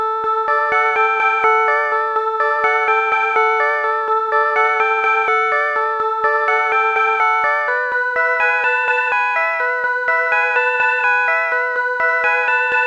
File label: organ shorties; MusicLine